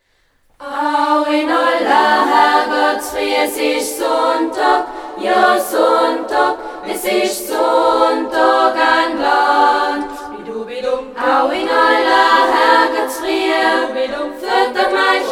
Sunntag Bauern Blues

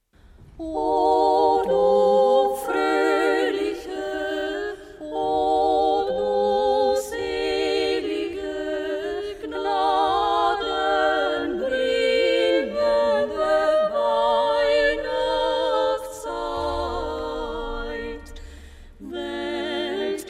Dreigesang Oh du frohliche

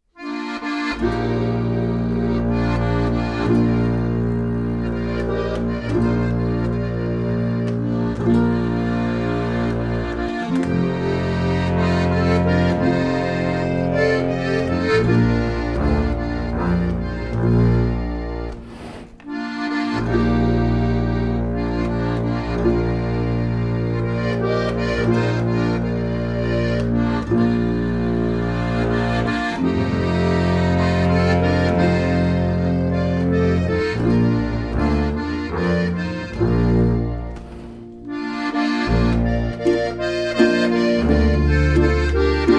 Jochweis

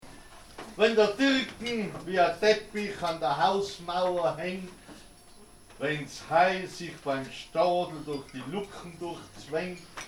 Weisenblaser

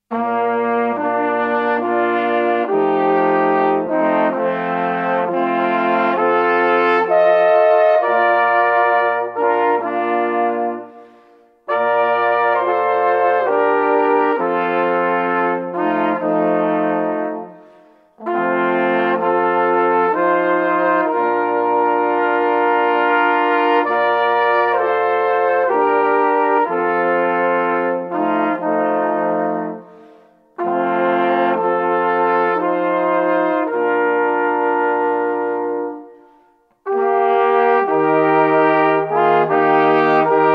Blaser

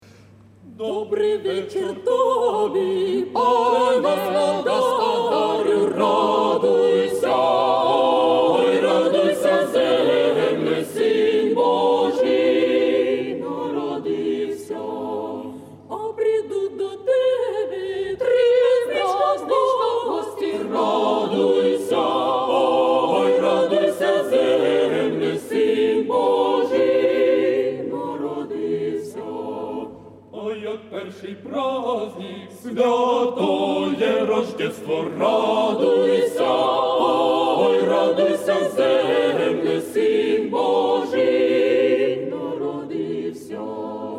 Jugendchor der rus orthodox Ki Moskau Russ. Weihnachtslied

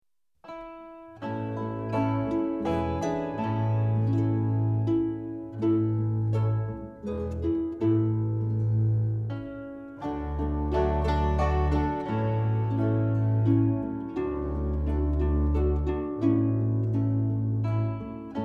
Ihr Hirten im Feld trad. Stubenmusik

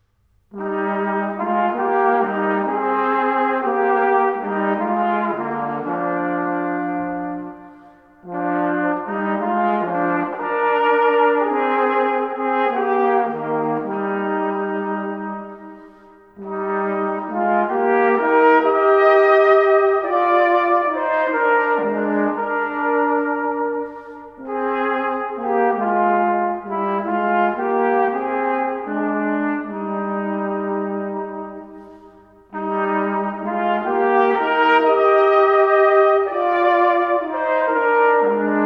Blaserduo

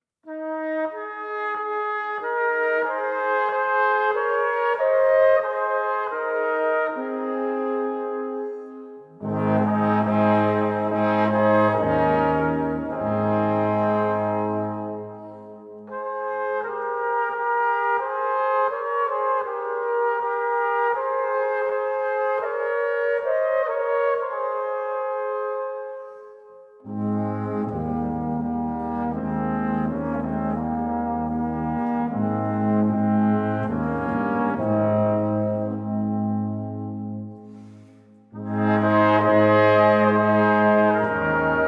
Ihr Hirten lauft nach Bethlehem Blaser

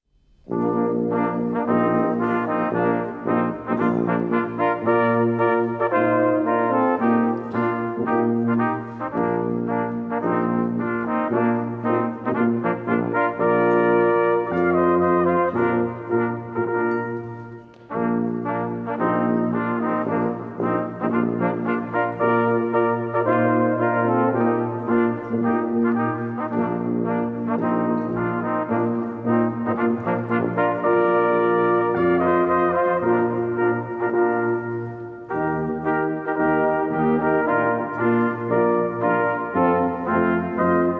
Weisenblaser Turmlied

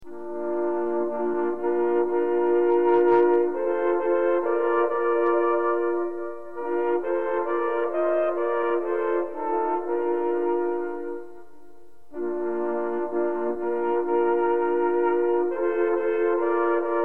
Adventweise blasergruppe

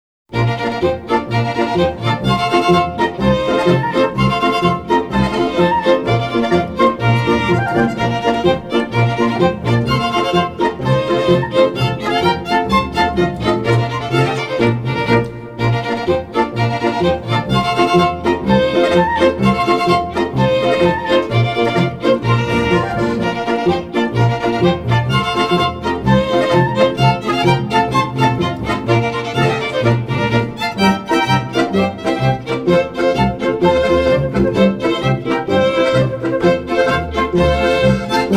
Himmelfahrtspolka

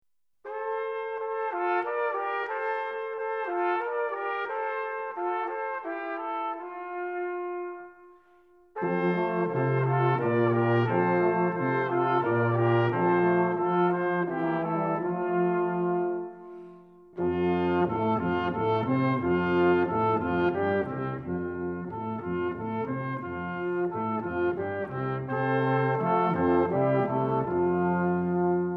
Kommet ihr Hirten trad. Familienmusik

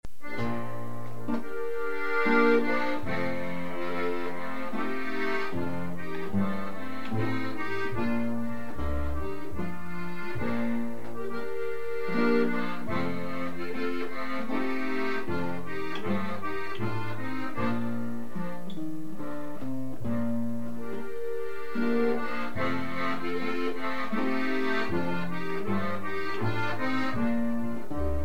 Bauernmenuett

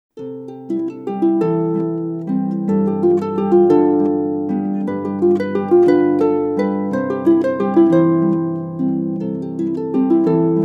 Zillertaler Walzer

